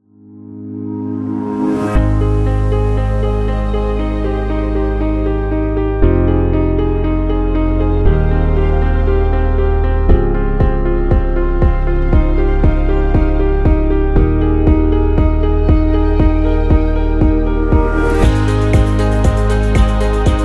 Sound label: Corporate Background; Promo